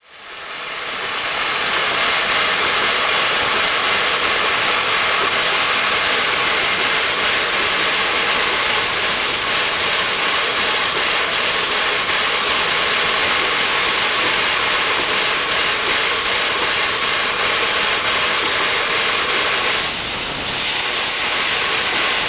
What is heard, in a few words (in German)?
dampflok sound china